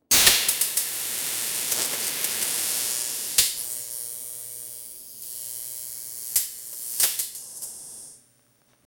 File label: sharp glass crackling, destruction